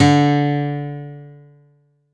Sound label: guitar d4